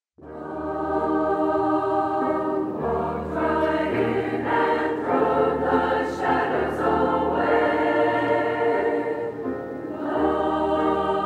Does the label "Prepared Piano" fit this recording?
No